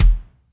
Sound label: Big Juicy Kick